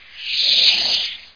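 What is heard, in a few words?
snake hiss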